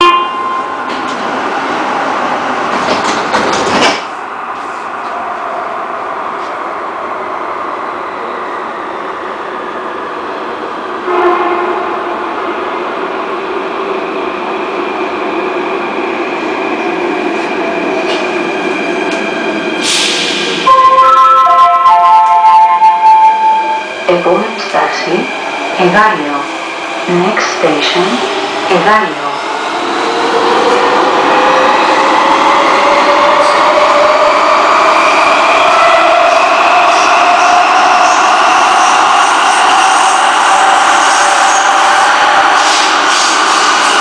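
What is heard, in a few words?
Athens Metro to Aigaleo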